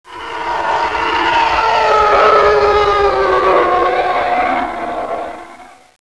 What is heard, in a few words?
fly by; tie; scarif